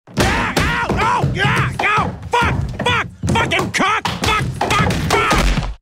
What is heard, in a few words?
falls down stairs; peter griffin